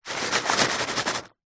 food wasabi peas plastic pack open shake; zapsplat

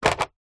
leisure toy gun rifle plastic set down on carpet

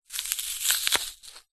food apple knife twist crush split

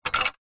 household gate wooden metal latch unlock open

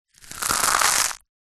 food egg shell pieces crack break crush; zapsplat

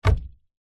household wardrobe door open freestanding wooden

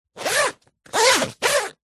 luggage rucksack backpack zipper; zapsplat

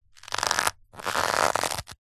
leather wallet bend stress creak

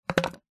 sport cricket bat set down on hard ground; zapsplat

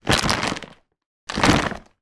plastic bag for life pick up put down; foley; zapsplat

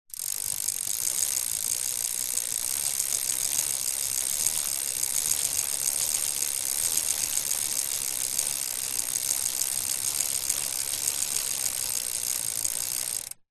bicycle pedal backwards wheel hub clicks; vehicles

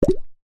bubble pop

